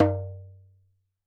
Djembe; Cymatics; Emperor World Percussion (Teaser)

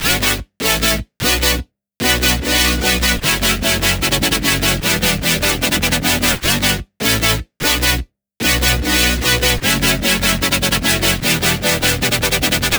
Chord Loop - 150 BPM B Min; Synths; Drop Loops; Future Bass; Heater

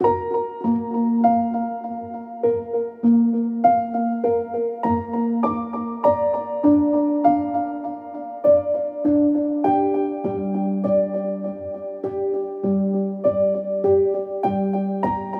Piano - Am 100BPM; STEMS; Ghosthack; SkyRealm; Mood Kits; Mood Kit 03 - Keepers Reach

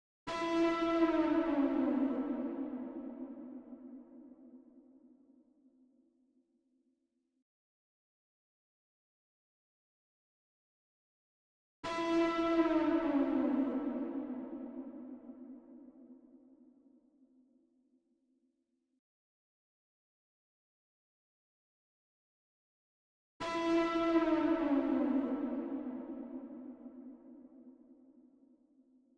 166 BPM E Min Synth; Cymatics; 2022 Melody Collection - Reverse; In The Clouds

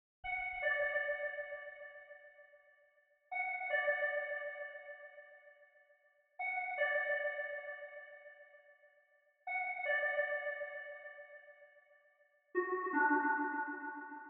78 BPM D Maj Pluck, Lofi; Melody Loops; Making The Bed; Cymatics; ANALOG EVOLUTION Beta; Lofi Collection

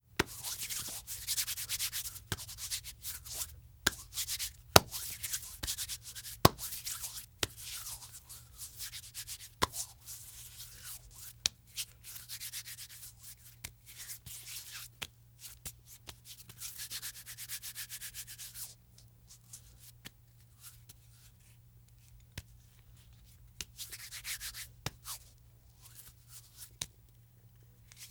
Hands Rubbing; Fairlight Sound Lib

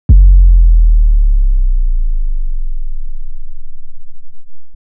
Hits; Take it Easy

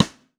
Birch Sidestick, Snares; Drums; Ghosthack - Free Snares 2023

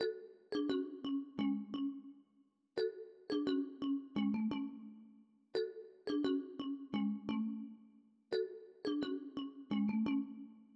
173 BPM F# Min Pluck, melody loop stem; Pied Piper

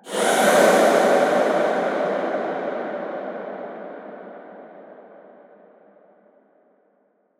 Atonal Depths, Psytrance

